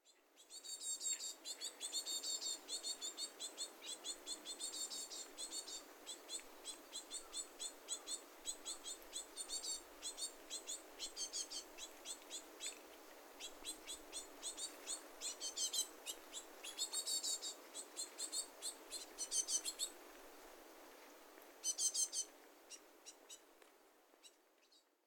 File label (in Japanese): シジュウカラ 幼鳥